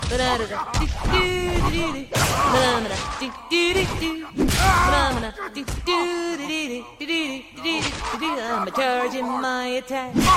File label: charging my attack